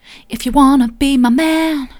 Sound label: soft, vocal